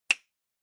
Finger Snap Foley, Dry

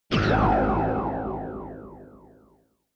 Retro Anime Accent Low

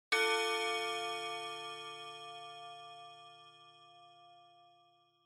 Pendulum Clock Chime Denoise Short

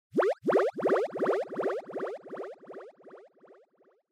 Anime Motion Low Delay

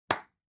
Hand Foley Clap Low

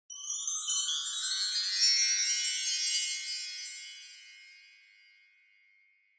Wind Chimes, Up Wide

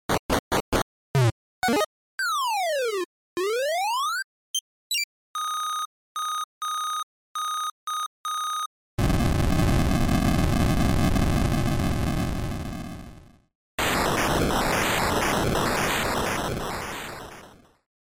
NES RPG

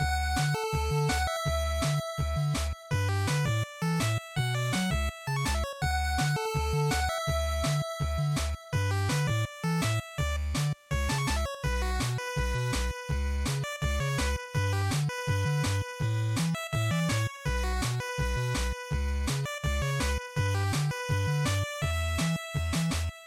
GB Sports Title Loop165

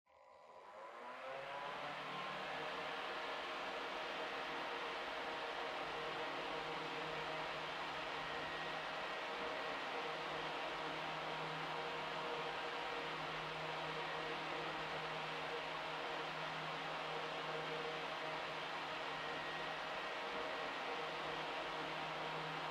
Electric Fan Far Low

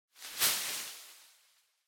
Throw Streamers Noise

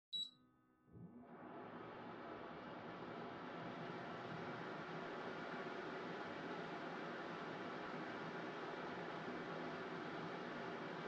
Air Purifier Far Mid

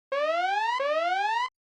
Warning Siren Low Short

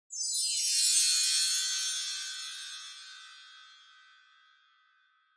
Wind Chimes, Reverb Fast Down Wide

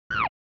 OS Sound Notification

